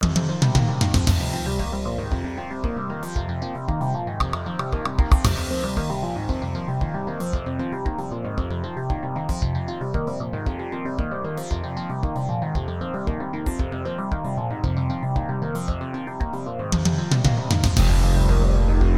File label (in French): Tapis sonore